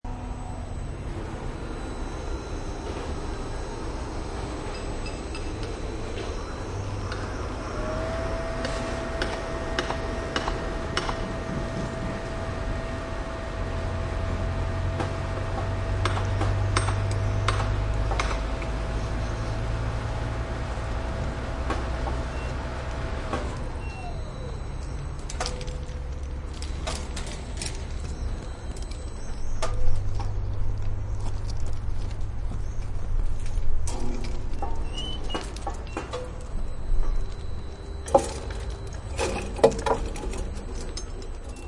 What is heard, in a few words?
crane load at construction site